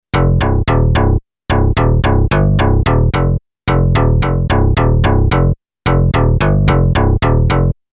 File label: bass